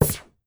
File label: Shortclick; Afterbern Democralypse; Windows